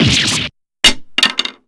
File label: damage; amara; nayna